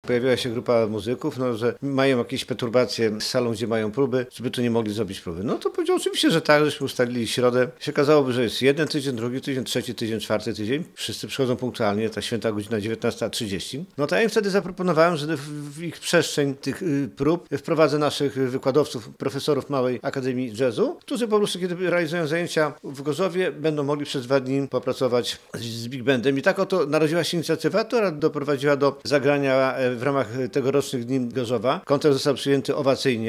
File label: jazz big band